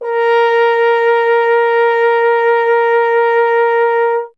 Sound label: F. Horn